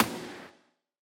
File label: electronic snare punch